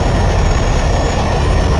Plane Jet Loop; Airplanes

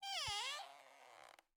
CREAK Wood Tree Creak Bright Very Subtle; mono; Universal Sound FX

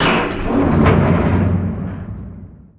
Hatch Large Metal Open; Doors

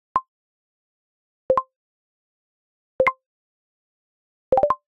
Arcade Click Positive

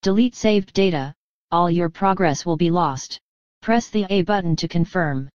menu; DeadFright

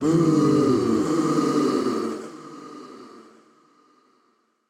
Crowd Booing; Pitch Black Audio Pong

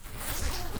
ZIPPER Short; FABRIC & CLOTHING; mono; Universal Sound FX

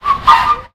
car skid; vehicles; NS Studios - ultra sound collection

